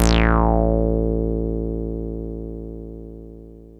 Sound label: 303 A1, TB 303